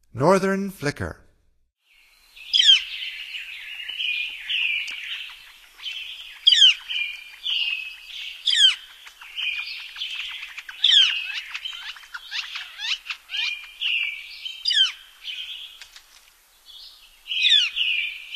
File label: Northern Flicker; North America; Stokes